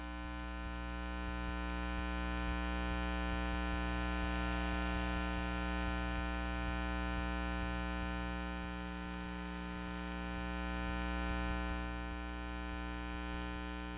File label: EM Room Noise, electromagnetic sounds; Micro Pack; NazdyNate